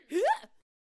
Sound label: Hay Baler Attack Vocals